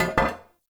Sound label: Stone Cafe Table Impact; Warframe; Ambience; Nineteen Ninety Nine